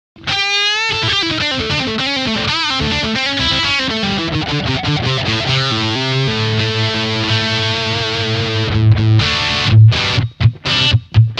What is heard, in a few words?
bridge, gibson pick